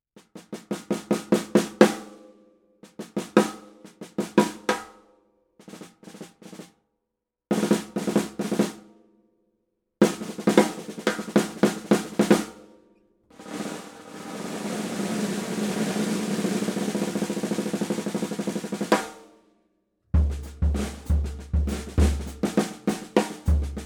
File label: 5x14 Supra; Ludwig